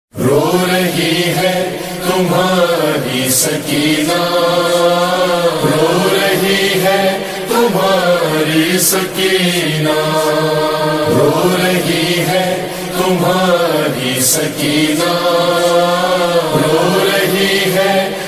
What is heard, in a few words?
noha tone